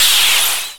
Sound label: Crowd; PGA Tour Golf